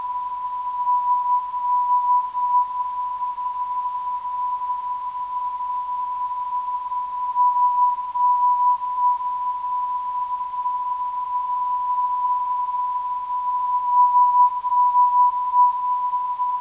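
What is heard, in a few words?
SSE 346kHz, non-directional beacon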